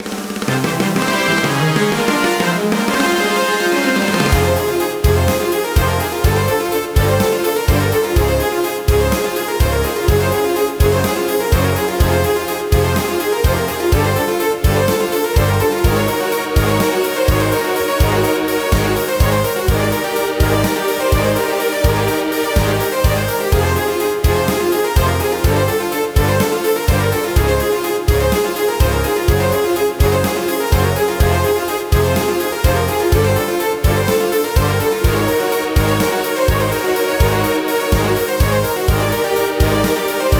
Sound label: fanfare